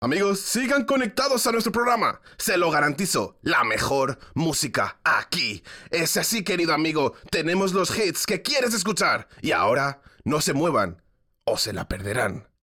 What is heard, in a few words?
Music Vehicle Radio Events; Jurassic World Evolution